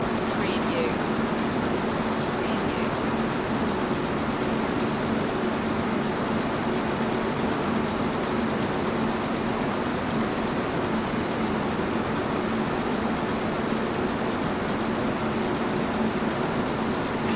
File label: air condition backyard loop